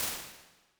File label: Short Plate, Lexicon 480L Reverb, impulse responses; Big Gee's